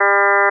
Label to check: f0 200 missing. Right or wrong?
Right